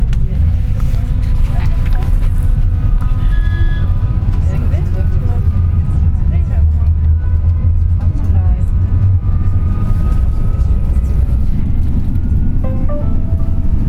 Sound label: train